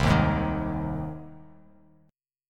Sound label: C Minor Sharp 5th C down Bass Standard; strummed; JGuitar